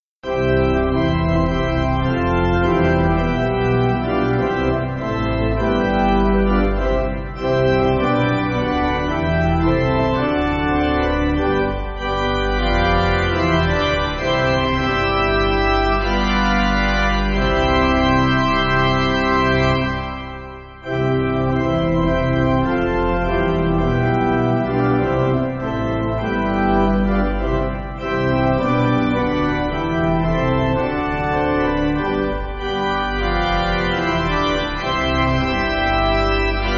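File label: Pipe Organ, Music Backing; Born In Bethlehem; Lindeman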